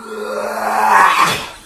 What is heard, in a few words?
uuuagh; garrysmod